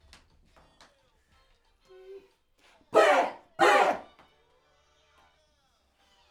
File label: Backs AKG